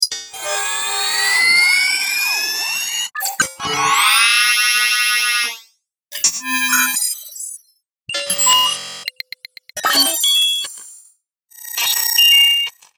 Robotic Ui

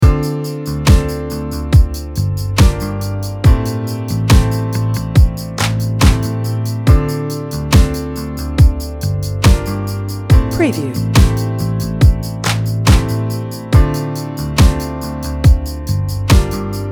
Upbeat Cheerful Playful Happy Dance Pop Beat

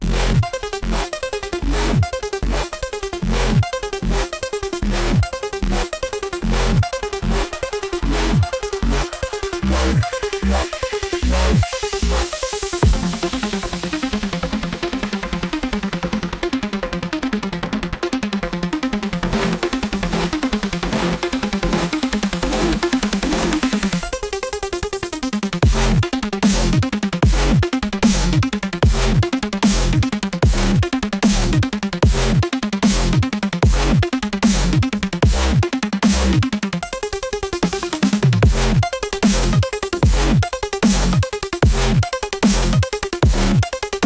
Hard Dubstep

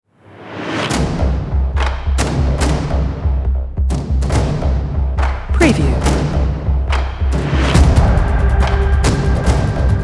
Cinematic Fast Tempo Action Drums Trailer

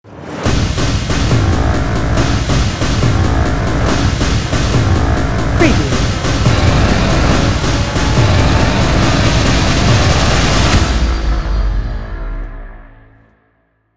Cinematic Action Trailer Countdown Intro Opener

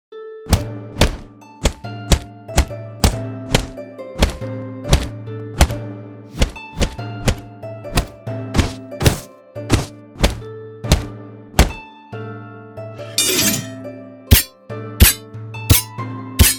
Combat & Fight Sound

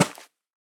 LEFT FOOT CONCRETE COTTON DYN STRONG

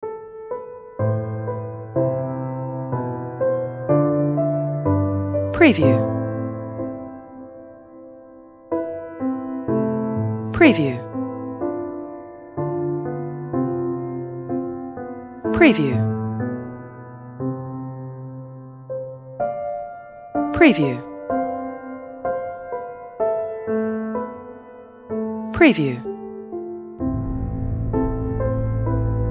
Emotional Sad Piano Music

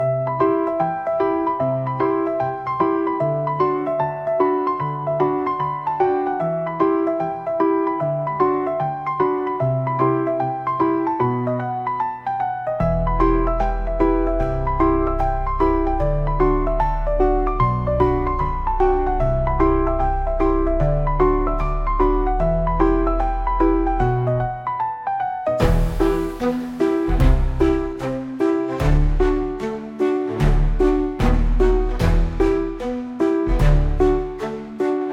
Beats

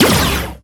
Launcher Release

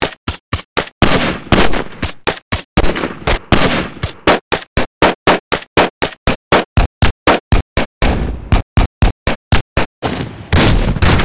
weapons

